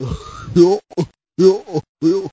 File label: Goofy Laugh